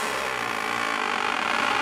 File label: Squeeze